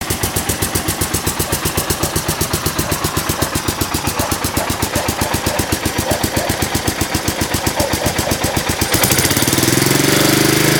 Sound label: Savage Sporty